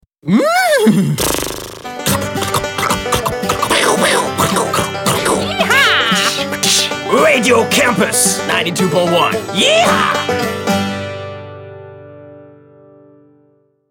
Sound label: cow boy session